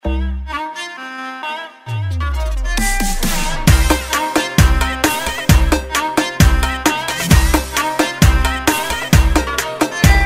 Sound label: Ringtone